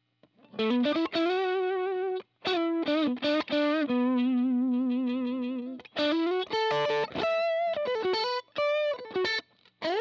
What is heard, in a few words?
Neck and mid drive, guitars